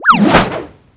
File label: Mandragora Attack; Seer; GamigoNA